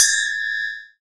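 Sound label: cymbal, Ethnic Perc; Dance Synths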